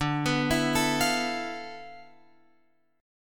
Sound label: D Minor 6th Add 9th Guitar Open F; Dm6add9 arpeggiated; JGuitar